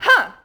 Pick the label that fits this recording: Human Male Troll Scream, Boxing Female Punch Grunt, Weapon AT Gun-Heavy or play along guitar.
Boxing Female Punch Grunt